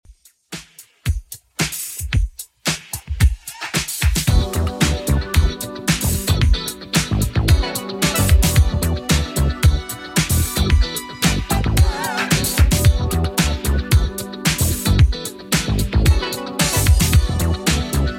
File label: 80s Redrum 112bpm